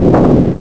Lava Block Rattle; A lava block rattling before sinking

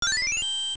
Get Item, Get an inventory item (or fairy); LOZFDS

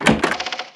Door Open; Door opening and closing; zelda

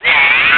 Spin Attack; Link's spin-attack shouts

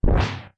Ball And Chain; LTTP; Ball & Chain being whirled (also Helmasaur King's tail wagging)

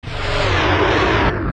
Ganon Dizzy

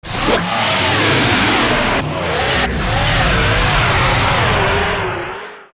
Gohma Die

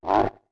Oink; Oinking with the Mask of Scents

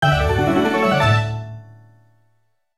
Fanfare New Song; Learn a new Wind Waker song

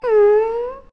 Mmm; Romani: "Mmm?"

